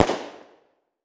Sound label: Weapon Machine Gun World War II Historical Single Shot; AdobeCC